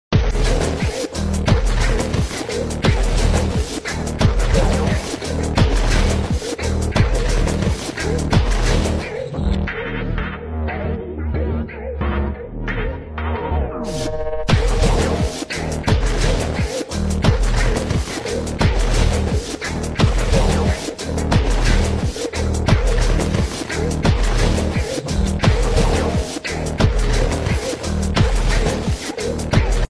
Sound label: groovy long legs stomping to the beat